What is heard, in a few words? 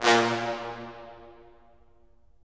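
brass cimb, Roland; Roland LA Composer vol. 4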